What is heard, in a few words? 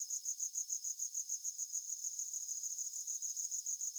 crickets loop; background; insects